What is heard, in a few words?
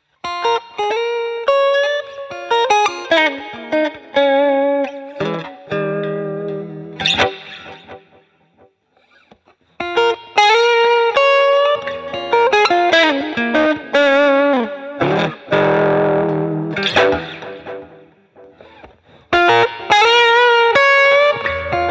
distortion, Distortion pedaal